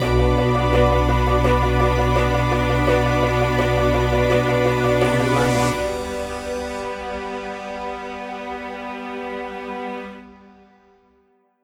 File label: Ascending Emotive Strings Action Track Stinger